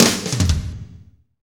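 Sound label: slow shuffle, Roland drum beats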